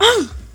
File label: pain; player